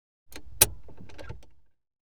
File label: Car, Interior, Handbrake Released; Auto; BBC Sound Effects Library